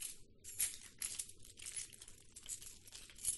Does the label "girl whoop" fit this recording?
No